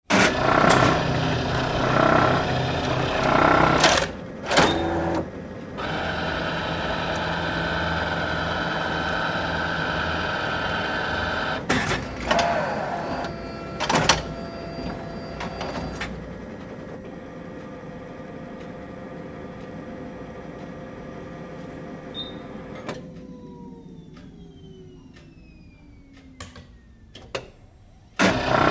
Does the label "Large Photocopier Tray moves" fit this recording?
Yes